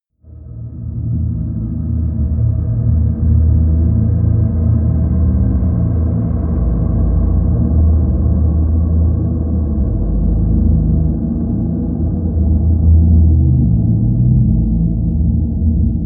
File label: drone noise